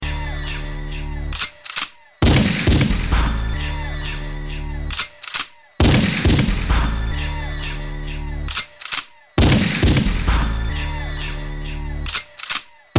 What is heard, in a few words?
Gun Ringtone